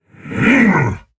Attack Effort; Warframe; Dax Heavy; Battle Barks

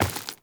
Step Flesh, Footsteps, Walk; Warframe; Characters; Tenno

